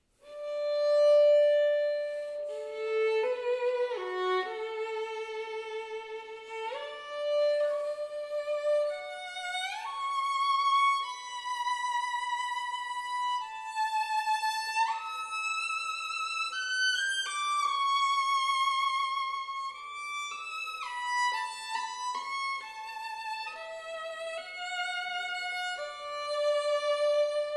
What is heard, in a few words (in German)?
Violine